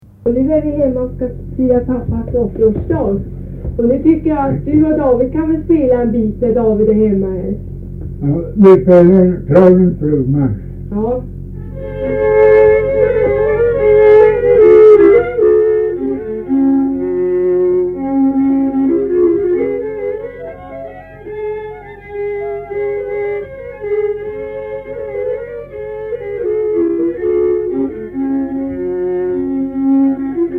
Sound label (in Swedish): Brudmarsch